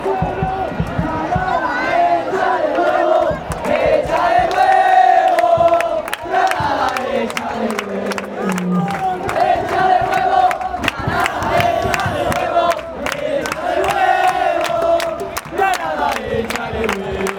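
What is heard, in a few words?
fanchants, football chants; echale huevo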